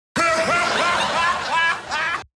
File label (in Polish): smiech